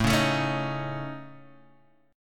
A Major Flat 5th, Guitar, Half Step Down

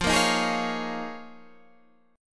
D sharp 7th Suspended 2nd Suspended 4th F, Guitar

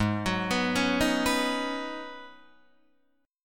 G sharp Minor 11th G sharp Guitar DADGAD, chord

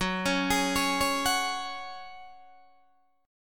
F sharp Suspended 2nd, Guitar, DADGAD

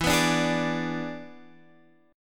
E 7th E down Guitar Drop D

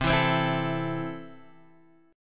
C 6th, C, down, Guitar, Open G

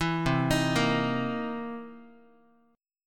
C 7th Sharp 9th, Guitar; arpeggiated; JGuitar

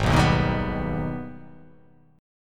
G sharp Minor Major 7th G sharp down

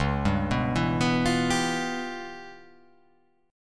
C sharp 7th Sharp 9th Flat 5th, C sharp, down

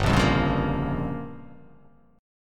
G Minor 7th Flat 5th

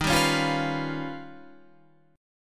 Eb Augmented 9th, Guitar Standard; Eb+9 strummed; 11,10,11,10,12,13; JGuitar

